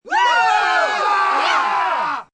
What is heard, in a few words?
Group Cheer Woo, Cheering, yelling, group, short (3-7 people), sports (human voice); Crowd; Crowds; Sound Effects:Voice; Voice Box